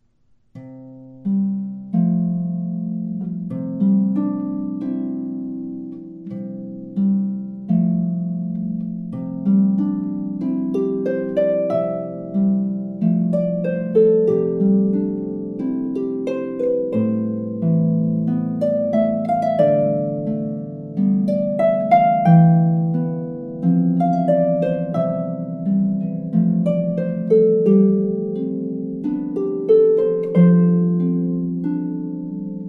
folk example, fade out

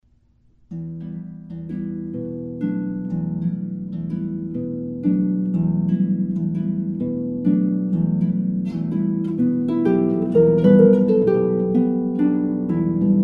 jazz example

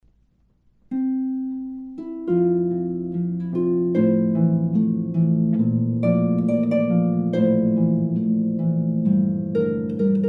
classic pop example

